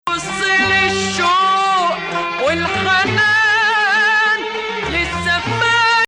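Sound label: Ajam, Arabic music; Ajam, which resembles the Western Major scale, has two distinct versions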